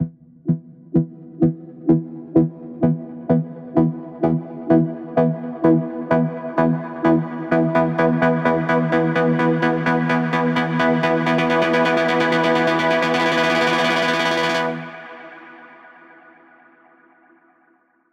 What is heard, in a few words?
128BPM Emu E, Melody Fills; 8Bars; Vengeance Samples